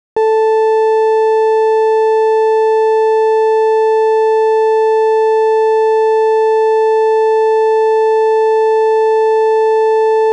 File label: Polarity Test for Lines; Rohde & Schwarz; UPA; Rohde & Schwarz UPA-CD